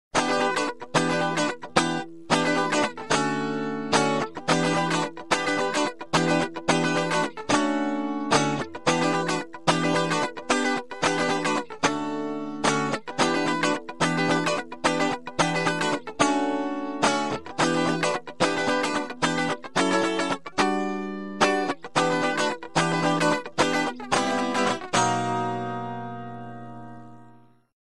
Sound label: Rythm Guitar